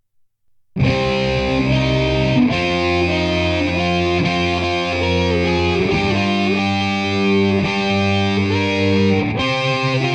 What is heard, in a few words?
Hardcore Mix